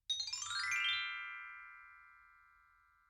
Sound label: game loading